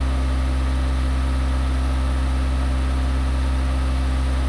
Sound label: Diesel